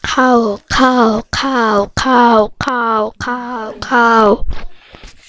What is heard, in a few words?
cow